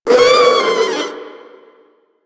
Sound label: Vocal Short Attack; Enemies; Warframe; Infested; InfestedNinetyNine